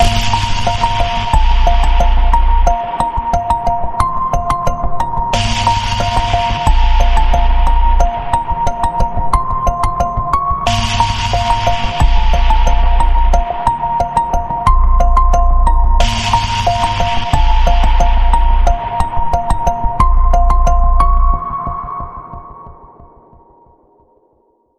Pulse, A sharp

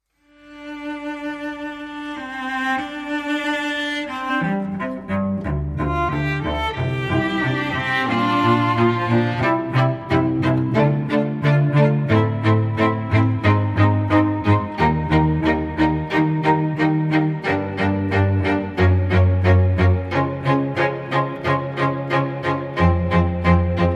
String Quartet Only

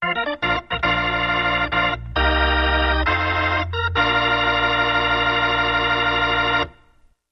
Organ Fanfare

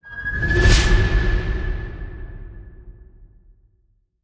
Starship Flyby

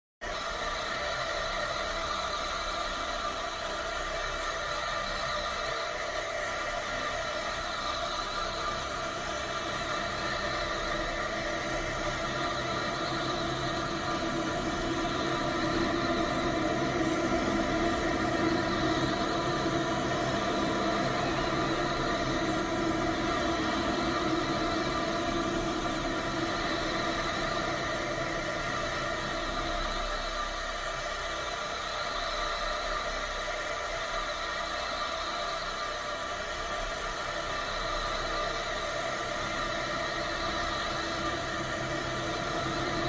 Drones

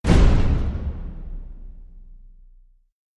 Hit Big GMC Hit

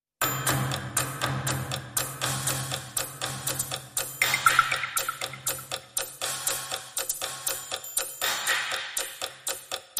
Ticking, Hits Only

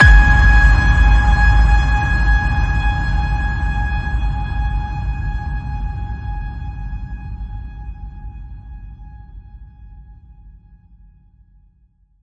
Ping, G sharp

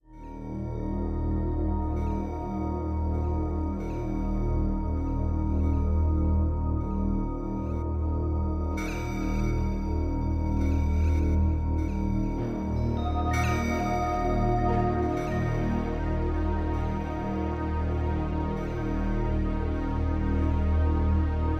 Menace Drone Full

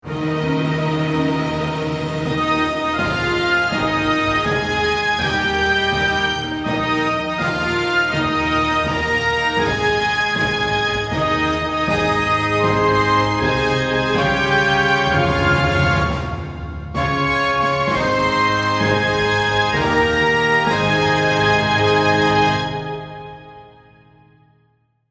Orchestra Happy Birthday

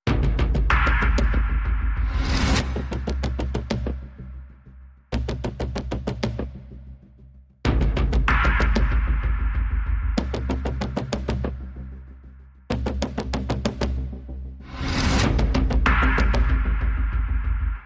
No Piano

